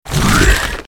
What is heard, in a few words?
elemental earth attack; mobs